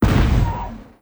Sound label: small explosion